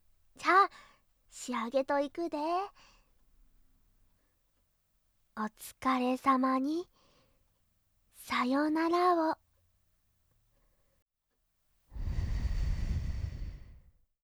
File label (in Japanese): 耳吹き; おつかれ様にさようならを; 生き通し